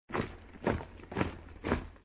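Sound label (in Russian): армия идет аккуратными шагами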